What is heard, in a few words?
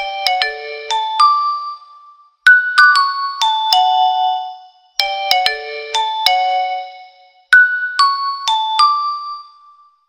Ringtone; Oppo X3 Pro